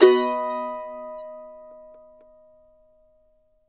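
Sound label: MANDO MAJ B major up, Left; Sampleheads; Whole Lotta Country 1 & 2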